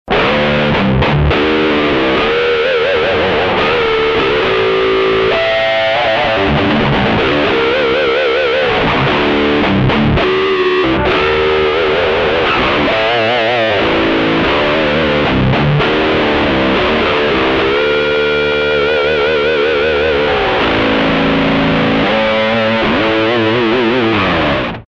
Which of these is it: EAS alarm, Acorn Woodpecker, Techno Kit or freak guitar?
freak guitar